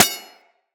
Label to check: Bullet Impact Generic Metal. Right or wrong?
Right